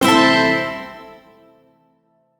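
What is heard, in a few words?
Shawzin Pentatonic Major Chord; Music; Warframe; Duviri; Chords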